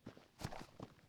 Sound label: step grass, footsteps